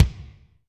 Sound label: Kik Tite Room, Drum Machines Alesis D4